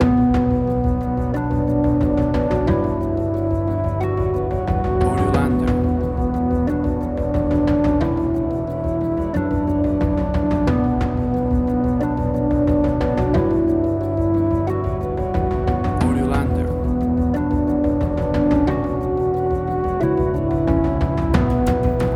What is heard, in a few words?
Airy Industrial Tension